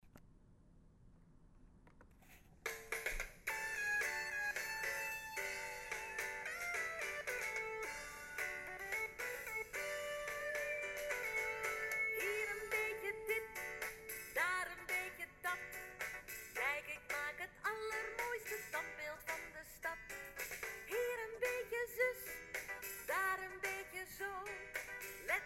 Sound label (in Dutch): lied gezongen versie; muziek onze stad groep 3 4